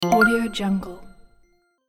heal

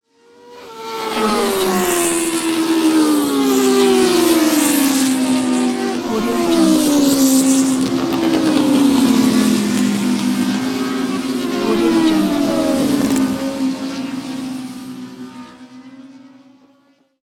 sport motorbikes racing

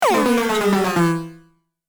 cartoon descent steps wobbly; cinematic designs

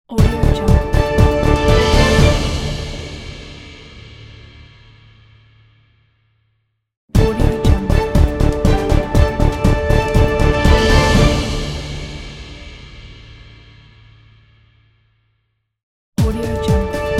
breaking news intro

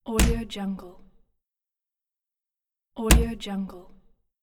user interface mechanical cancelling

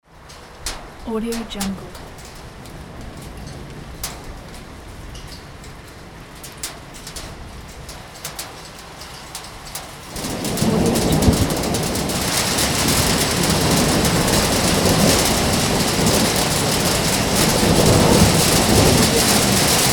hail and thunder

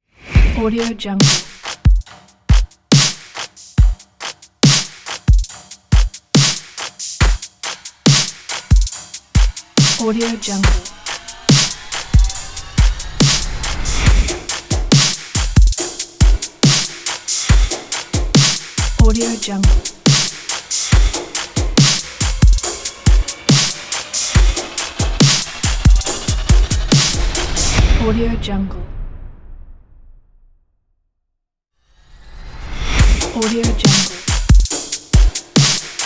electro ethnic logo ident

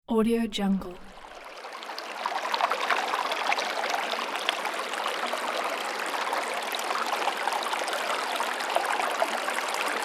water flow

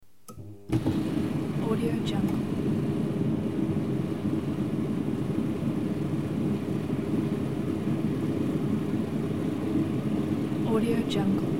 start washing machine

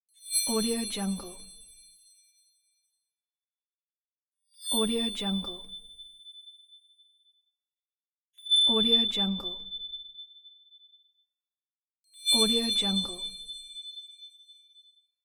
shine; sounddivision

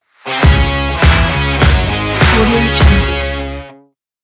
riff logo

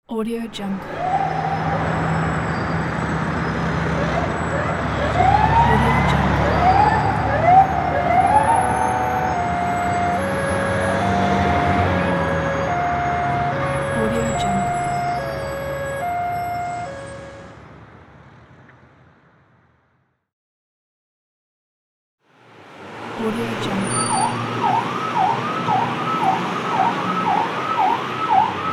city traffic with ambulance and police siren pack

